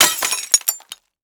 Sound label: Crash Ceramic Sculpture Breaking On Concrete; crashes; AdobeCC